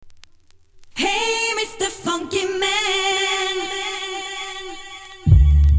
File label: french boogie